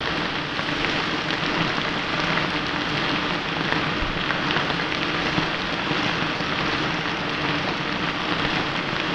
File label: large industrial deep fat fryer in food factory